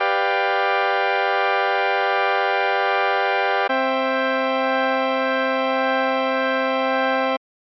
dominat sept tonika gleichstufig

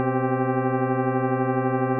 c g unrein mit differenzton

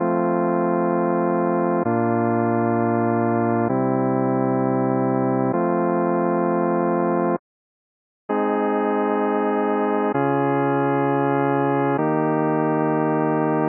c dur as dur pythagoreisch

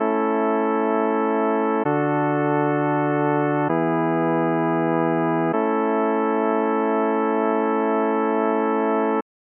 kadenz mitteltoenig

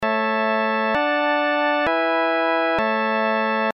kadenz mittel

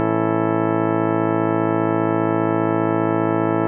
Schussakkord richtig

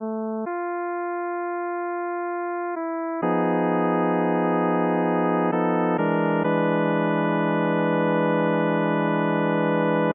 tristan gleichstufig

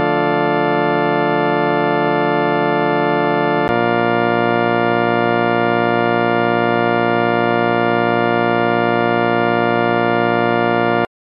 d moll b dur rein

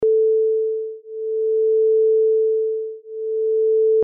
sinus

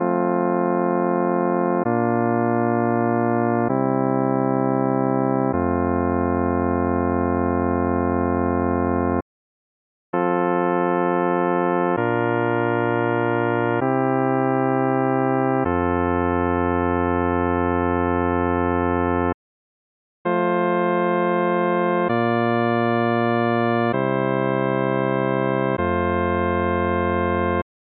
vollkadenz rein

